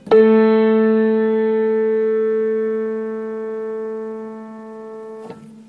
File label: A3 A4 pure just octave